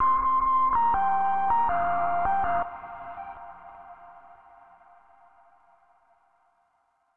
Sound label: Overlay Tone; Savant Extraordinaire